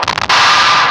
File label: BACKFIRE, PHUZZ DRUMS; Spectrasonic Distorted Reality 1